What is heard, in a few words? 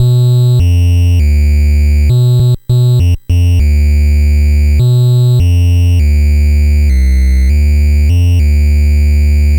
NES Bass, chiptune; Sonic Foundry (Sony Creative Software) - 8-Bit Weapon A Chiptune Odyssey; Nintendo Entertainment System